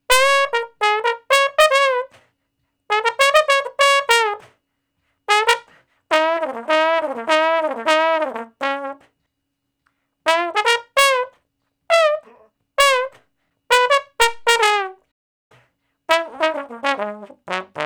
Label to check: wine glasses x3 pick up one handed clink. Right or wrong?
Wrong